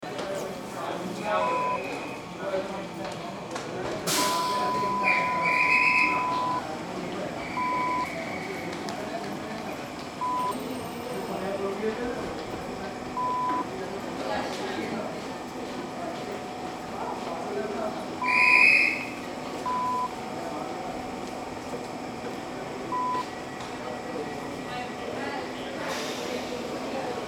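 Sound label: Train Station Platform Whistle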